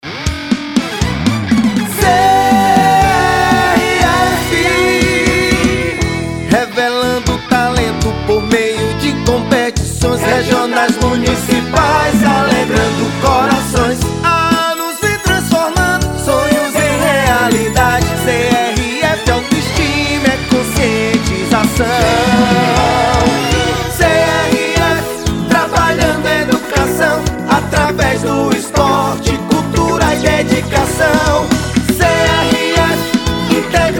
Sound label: jingles